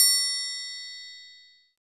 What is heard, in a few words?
triangle, percussion